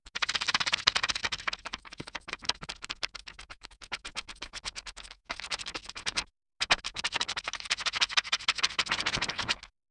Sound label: long slow riffle shuffle, cards